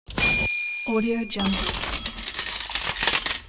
cash coins

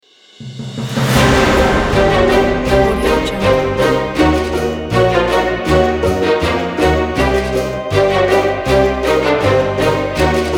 halloween

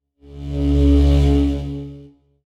pulsing tone buzzy mid range synth tone

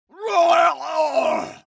human vocal male groan painful double

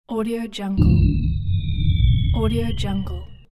the sound of a flying shuttle; valinsound

